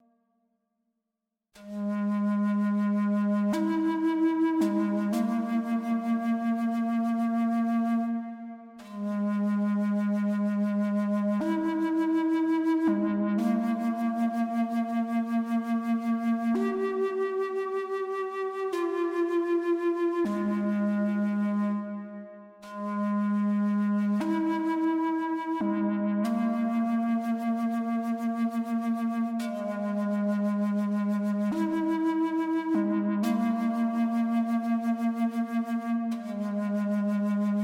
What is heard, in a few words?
native american flute